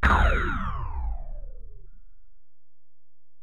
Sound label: Tom, Alesis DM-PRO, Drum Machines, Toms